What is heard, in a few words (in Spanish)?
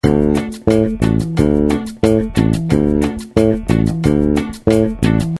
Patron bajo cumbia; how to play cumbia bass